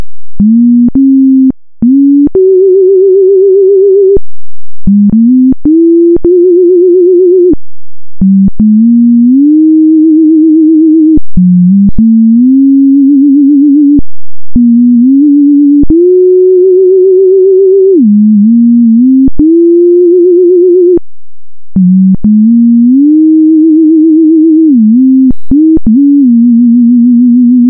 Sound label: f0 Model resynth Sin